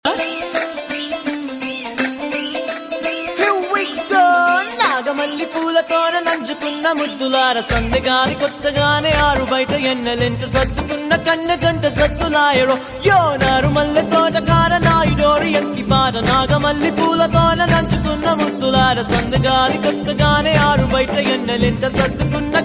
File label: Ringtone